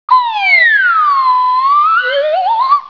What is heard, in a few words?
slide